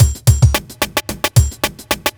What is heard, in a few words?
block rock kit